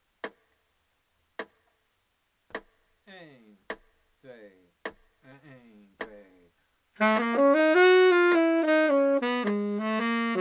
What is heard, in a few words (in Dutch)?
jazz lick in klinkend Gb F E Eb